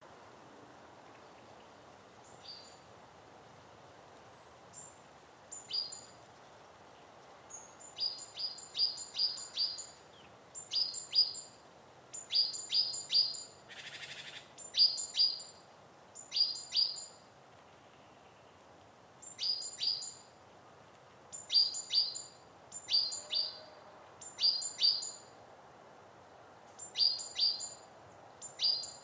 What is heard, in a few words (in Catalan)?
Duet ferrerico ropit hivern matí